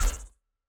ball hit light; CrossCode; battle

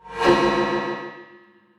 shredder swing; battle

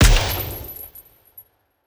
weapon shot; kolibri; colibri; battle; CrossCode

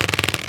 rubberpull, balloon; World Of Goo

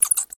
batsqueak; World Of Goo

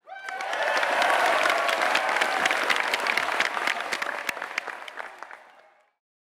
applause; CrossCode; arena

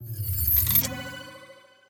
key pillar unlock

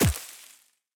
plant hit

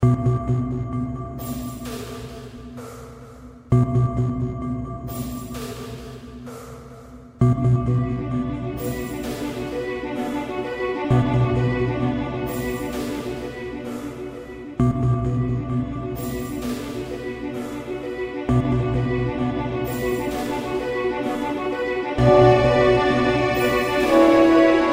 puzzle bgm; CrossCode

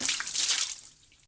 splash, water; World Of Goo

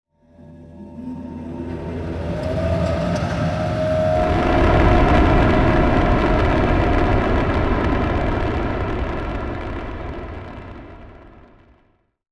intro pod; The Swapper

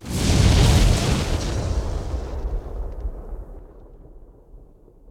lights on; World Of Goo

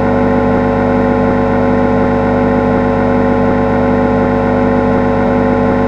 anticlonefield hum; The Swapper

